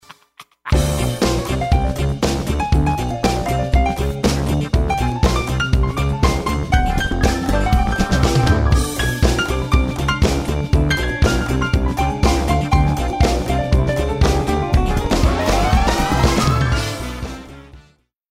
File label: rnb